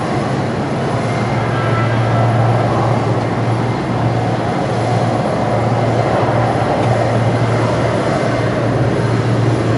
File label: AIRPORT; 1000fx